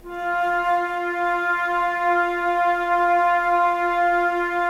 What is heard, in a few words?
flute, Wood Winds, Flutes